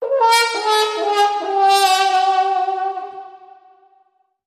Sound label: Elephant Blues